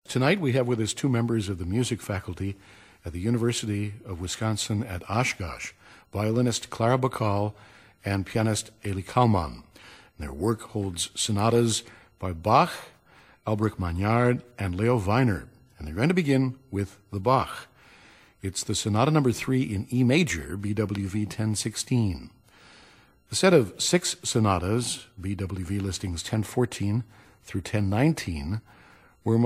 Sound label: violin, piano